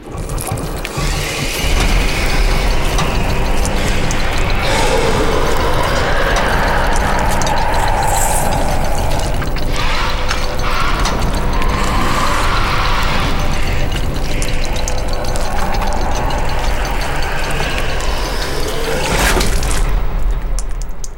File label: Caged Creature Exit; silent hill homecoming 2008 gamerip pc ps3 x360